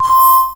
TECHFX, Hyper Dance EFFECTS; Best Service

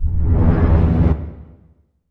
cinematic impact wipe

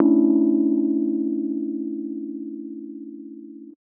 125 Rhodes Dom E, Keys, Nu Jazz House; PowerFX - Nu Jazz House

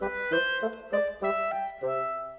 Winds, Orchestral; Sonic Foundry (Sony Creative Software); Orchestral VOL-2

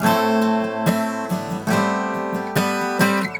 GTR, Celtic Flavours; USB Soundscan vol.42; AKAI

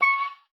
OBOE2, C#6; Xsample VOL-6

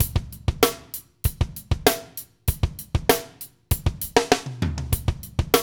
DRY; AMG - Now CD-ROM (Roland); Keith Le Blanc

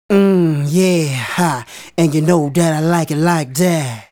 Male Solo, Vocal

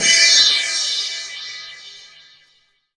Dance Drums crash L; Dance Mega Drums; Best Service; CRASHES

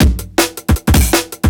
FRACTURE BREAK

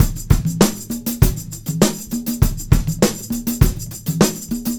Combo, R&B Drums

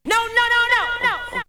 Vocal; ZERO G - Datafile DISC 2

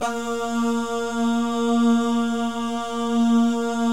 BAH GUY, BAHS GUYS; Spectrasonics; Vocal Planet; Jazz FX

